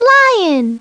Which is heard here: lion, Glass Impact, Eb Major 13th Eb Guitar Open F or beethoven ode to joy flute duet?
lion